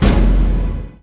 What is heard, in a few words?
hit